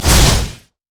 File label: hamstring; Darius